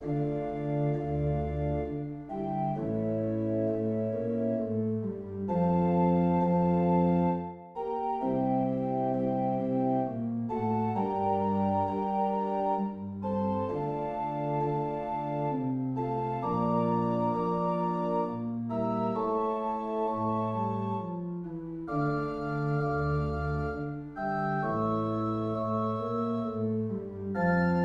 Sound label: Sarabande in d moll